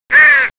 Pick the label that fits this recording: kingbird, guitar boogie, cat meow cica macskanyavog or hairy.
kingbird